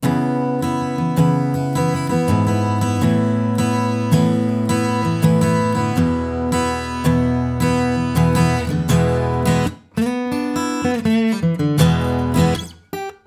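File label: mixing guitar mix warm reverb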